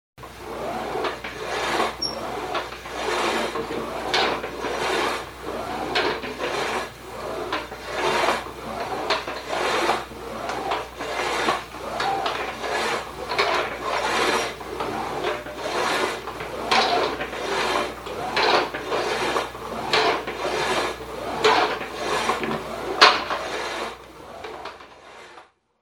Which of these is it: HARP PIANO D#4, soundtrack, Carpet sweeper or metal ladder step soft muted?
Carpet sweeper